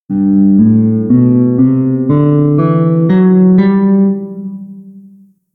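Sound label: G Major scale, guitar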